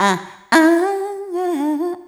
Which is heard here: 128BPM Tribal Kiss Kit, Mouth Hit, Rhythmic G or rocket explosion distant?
Rhythmic G